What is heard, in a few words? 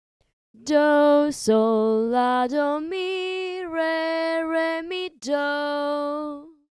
solfa melody